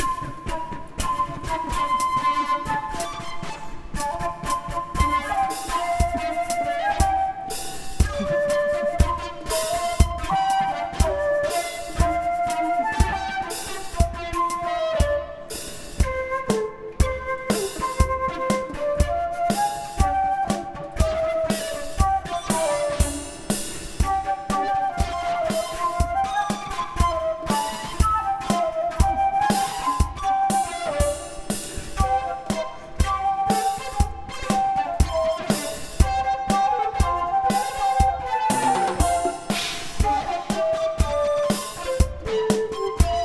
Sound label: metal Flute